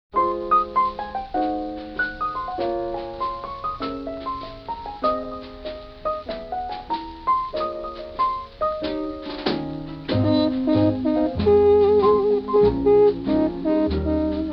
Мелодии трубы

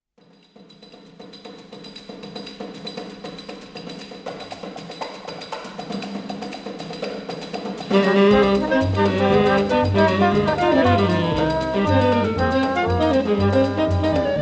septet

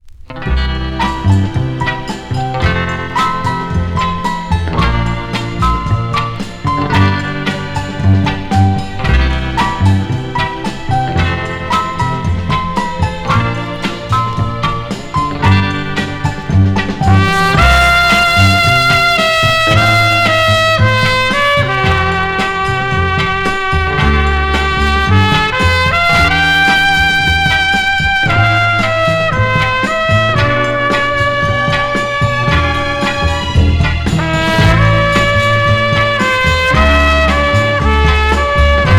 Мелодии трубы

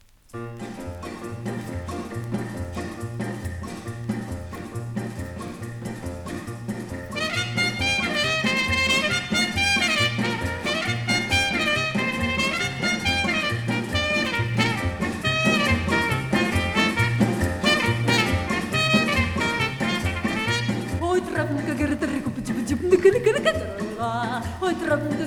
brazilskaya shutochnaya pesnya skorogovorka

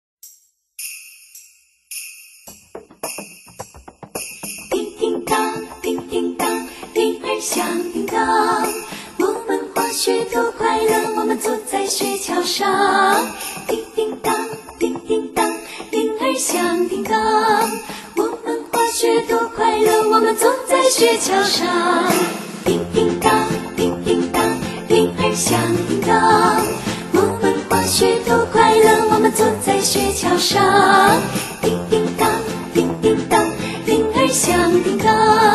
jingle bells na kitayskom

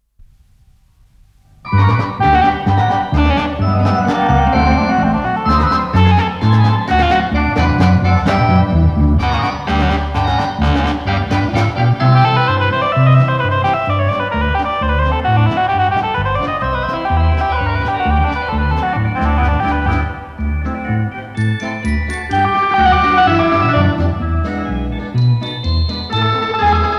polka, ansambl elektromuzyikalnyih instrumentov; МАГНИТНАЯ ЗАПИСЬ

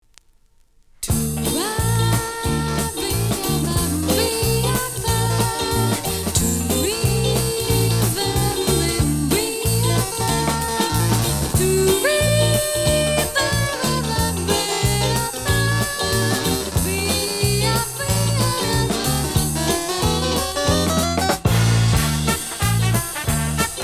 instrumentalnyiy ansambl, sredniy kvikstep

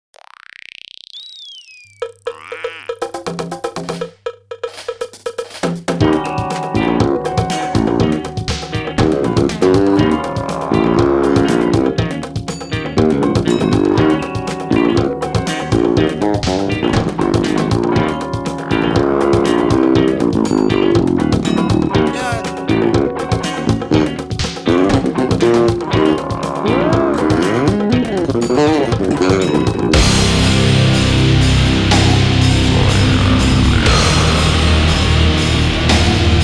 solo dlya bas gitaryi s gruppoy

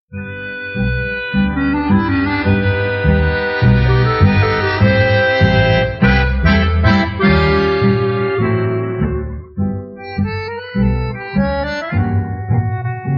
instrumentalnyiy kvartet

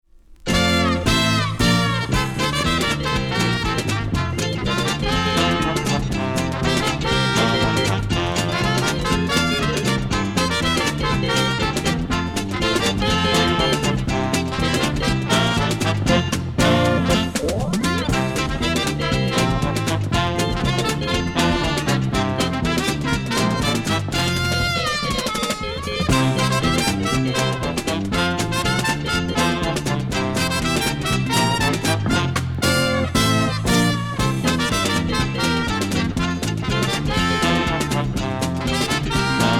instrumentalnyiy ansambl

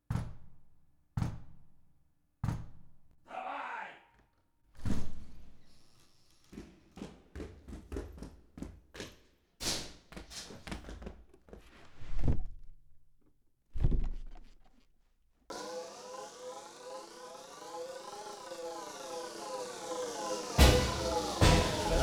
drum parad

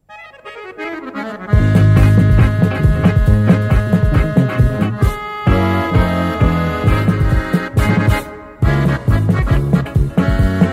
kvintet, akkordeon

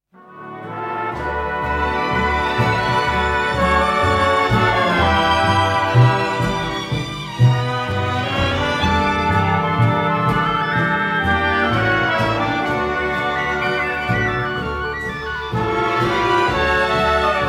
estradnyiy orkestr, tango serenada

